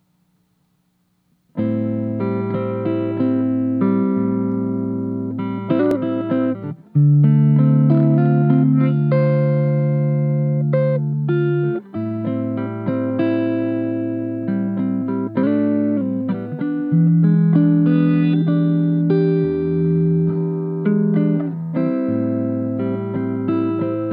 slow blues, Slow Blues – Fingerpicked – Neck Pickup